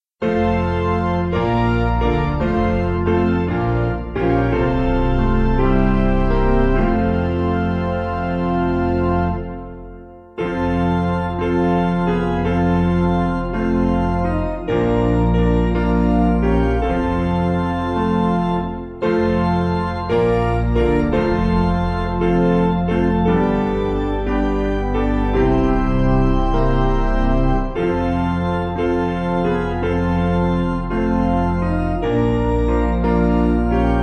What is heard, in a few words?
Piano